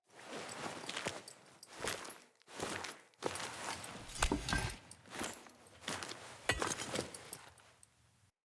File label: Blacksmith Hammer Equip; Blacksmithing; Friendly; Tuvalkane